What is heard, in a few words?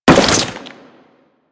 Step Flesh, Footsteps; Enemies; Crewman; Warframe; Corpus